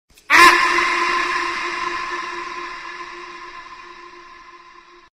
aaaughhhhh

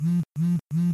vibrate d

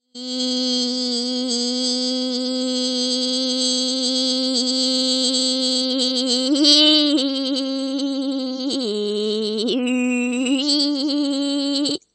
laugh

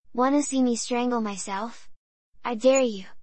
strangle

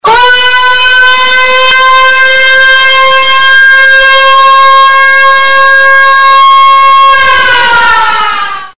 siren aaaa

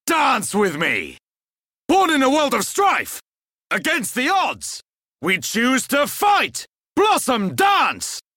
blossom dance

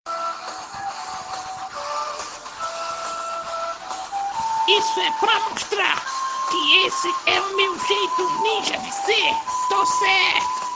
happy song; naruto

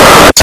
nut but very loud

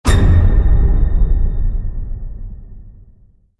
perilous attack sound effect; sekiro

